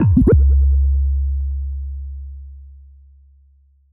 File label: Bass